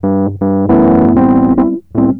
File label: Rhodes, Keyboards; Total Drum&Bass